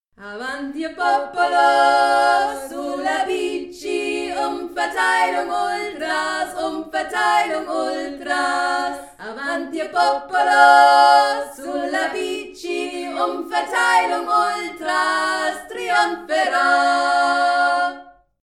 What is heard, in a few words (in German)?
Jingle; avanti; Umverteilung Ultras